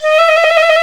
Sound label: FLT FIFE, British Fife, Fife Trills